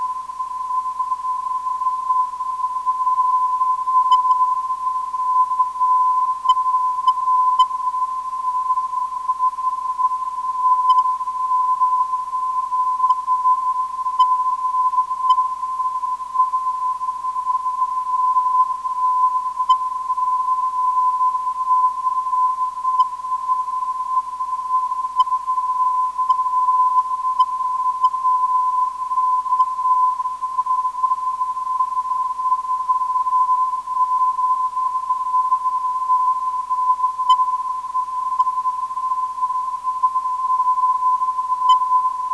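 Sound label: non-directional beacon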